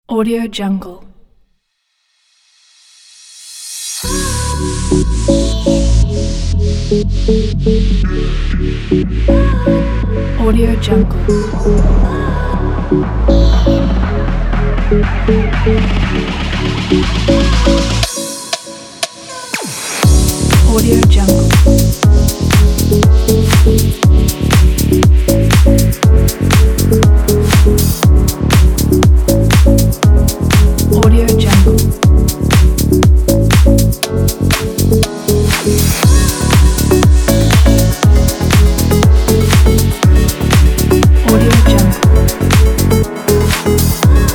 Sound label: lounge house